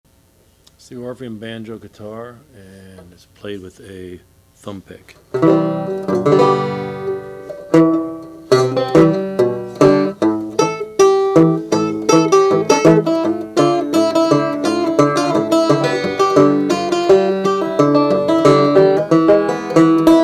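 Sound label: orpheum style 1 banjo guitar natural; 1919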